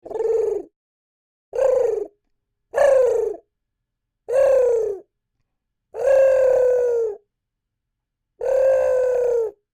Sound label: Creature Oodle Bird Call Outs; Birds; Animals